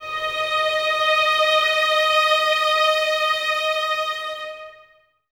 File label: violins D#5, String Ensembles, 23 Violins; Miroslav Vitous